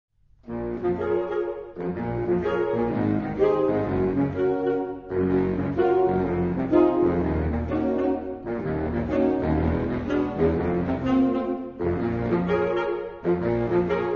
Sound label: Carnival Sax Ensemble